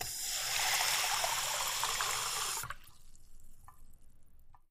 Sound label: Water Hiss Light, seltzer bottle